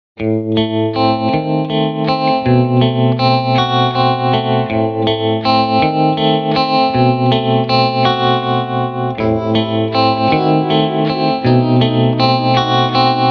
Vibrato; bogner Duende

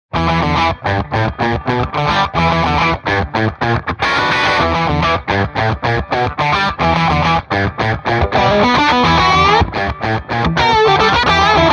bogner 3 channel B DUENDE

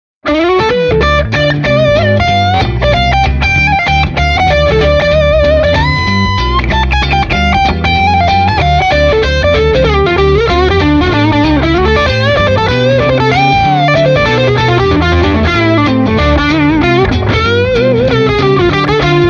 Bogner Duende Swing Channel

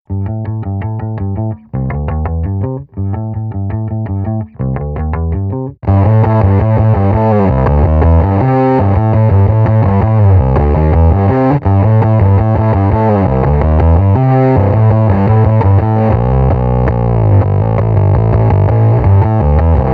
bassbully fuzz, pedals

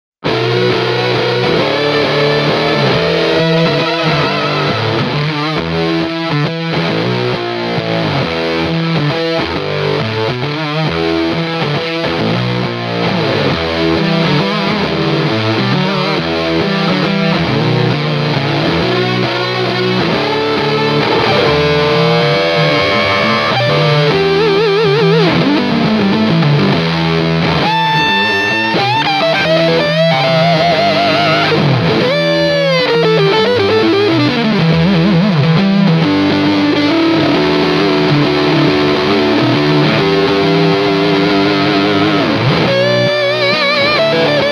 Scorpion - Dirty Boy Fuzzy Boy Pedal; mojave

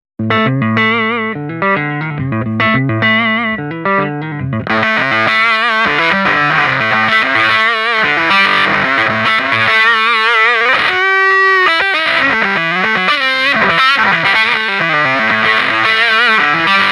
position 5, pedals

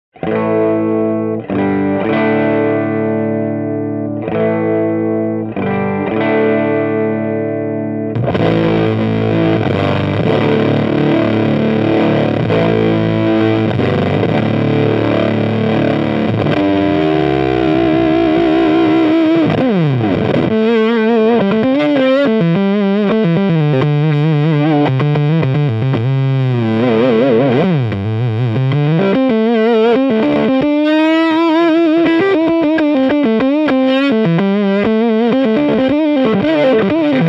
afro classic, pedals

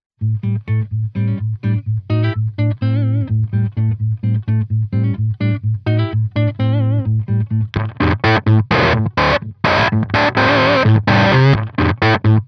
full octave input, pedals

